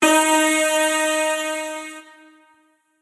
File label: Horn